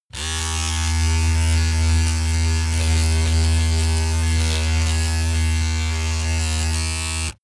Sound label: razor on load pov short boomy; maschinen; rasierer